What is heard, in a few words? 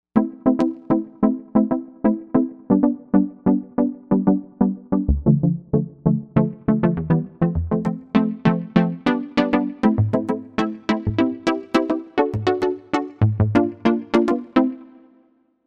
Plucker, Novation UltraNova; Factory Patches